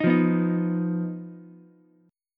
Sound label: Eb Suspended 2nd Sharp 5th, Ukulele; strummed; chord; JGuitar